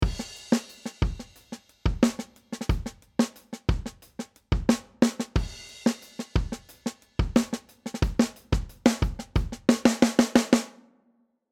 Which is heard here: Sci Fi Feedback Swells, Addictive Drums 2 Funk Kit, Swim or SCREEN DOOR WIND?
Addictive Drums 2 Funk Kit